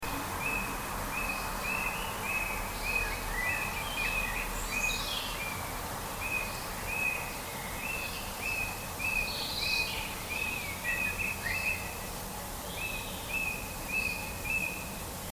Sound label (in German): vogel